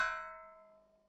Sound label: sharp metallic hit